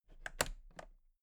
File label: toolbox latches close soft; industrie wirtschaft; handwerk